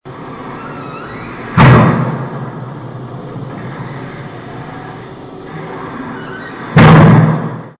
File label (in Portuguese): bater porta